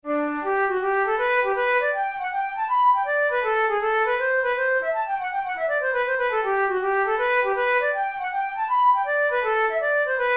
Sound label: hornpipe, flute